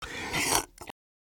coffee sip; Soldier; taunt

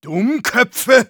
Medic negative vocalization, de; Dummköpfe!

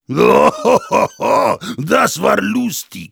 Heavy laugh long, de

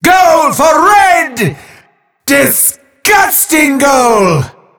soccer goal throw up; merasmus

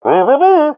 Pyro taunt thanks

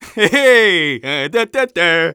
Scout taunt conga fun; Heh hey, dun dum dum der!

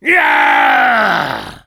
engineer battle cry, fr

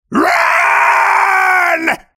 Sniper run

